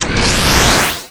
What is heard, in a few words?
Enemy Iceberg Active Both Arms Motion; disney star smash android ios mobile gamerip 2020